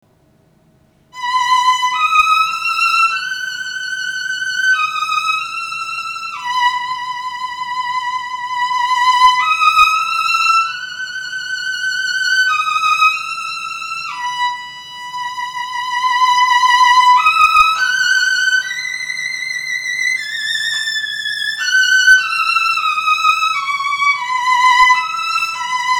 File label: violins; Celani